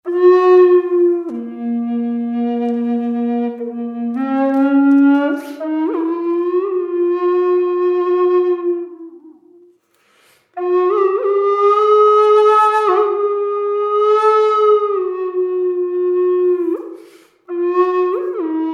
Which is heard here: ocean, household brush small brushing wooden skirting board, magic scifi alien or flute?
flute